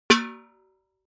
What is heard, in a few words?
object metal bucket hit ring; smartsoundfx